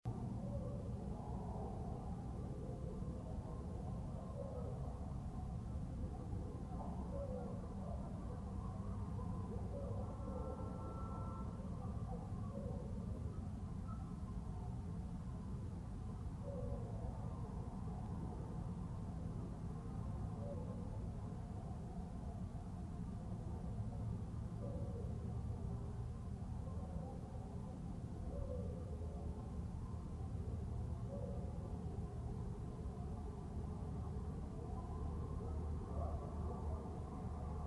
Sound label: possible owl